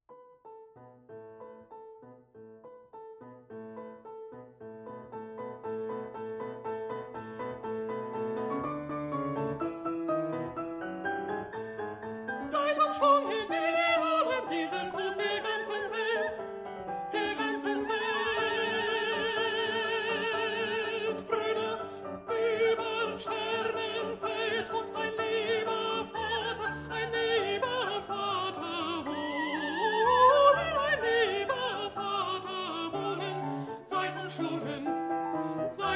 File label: Final Altos